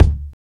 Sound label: strong bottom end bass drum D key; kick